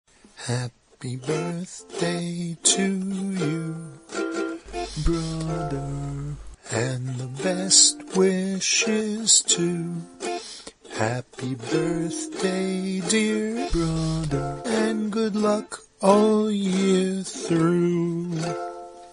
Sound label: Happy Birthday Brother Song